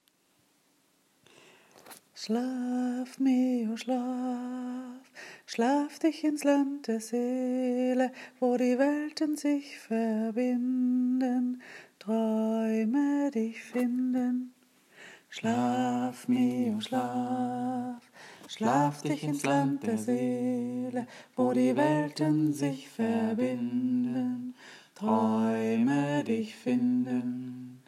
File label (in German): kind tagesschlaflied